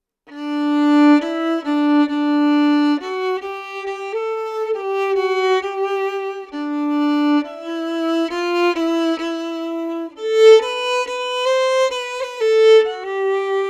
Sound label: Violin